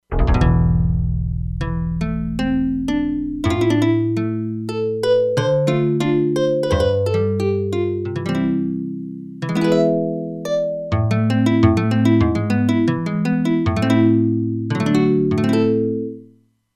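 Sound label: Classic Guitar, E-mu Proteus-2; Factory Presets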